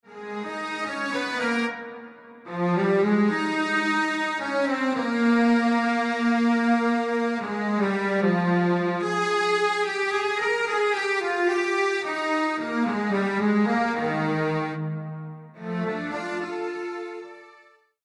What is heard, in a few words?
Strings Dual